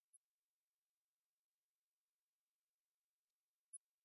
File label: the goblin coconut sound